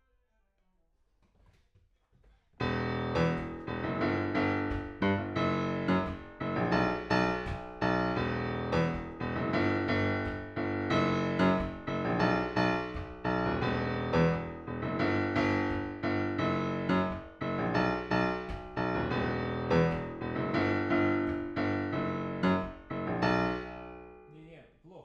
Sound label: PIANO